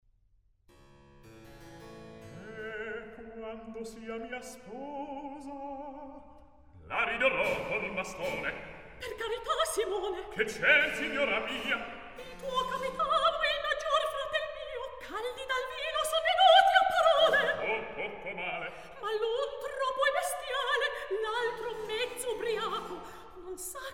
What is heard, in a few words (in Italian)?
Recitativo; Eh quando sia mia sposa; Mozart; La Finta Semplice